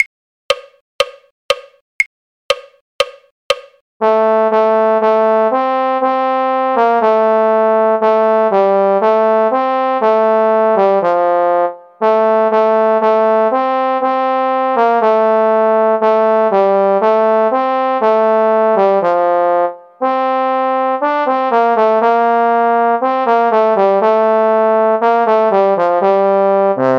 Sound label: trombone; carols